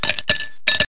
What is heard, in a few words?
Ice, Drink